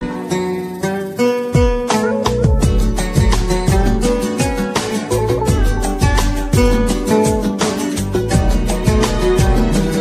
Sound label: cool ringtone, cool latin ringtone